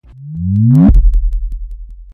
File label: swoosh